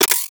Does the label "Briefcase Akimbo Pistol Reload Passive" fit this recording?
Yes